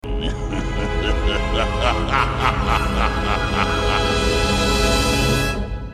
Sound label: laugh; dragaunus